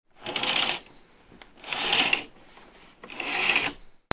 HOUSE

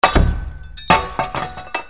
FALLING

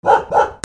DOGS

